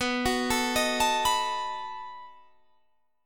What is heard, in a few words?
B 7th B down; arpeggiated